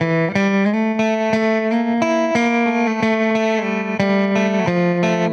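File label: Baritone Guitar 90, 90bpm; Loops n Lines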